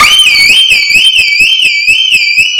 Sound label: scream alarm